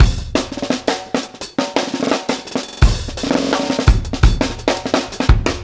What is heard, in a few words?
Bon Bon Kit 4 Bar, Drum n Bass Beats; Beats and Percussion; KJ Sawka; Drum n Bass & Beyond